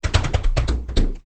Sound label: conga